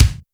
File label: kick, Roland R-70, BD